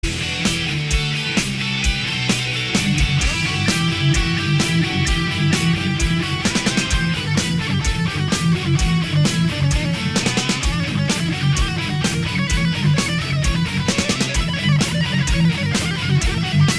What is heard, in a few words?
Solo